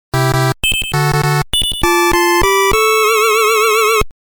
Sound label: Stage Clear (8-Bit)